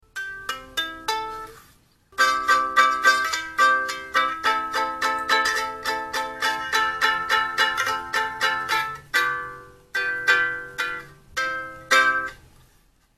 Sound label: basic squke sample, Plywood Ukulele; SqUkulele